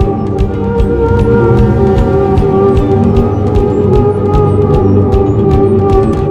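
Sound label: chariot music loop; smite; Apollo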